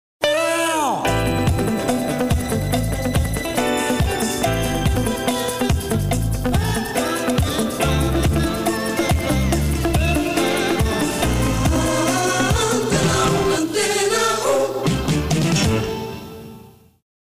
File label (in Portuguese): Vinheta; Antena 1 SP